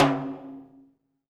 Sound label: TIMBALE, HR-16 DRUMS; EdgeSounds